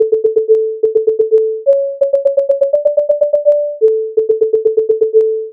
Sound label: adsr